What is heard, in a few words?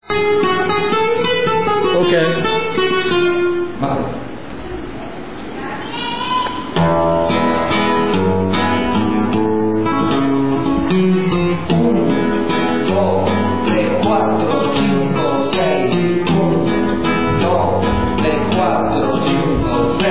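Guajira, flamenco guitar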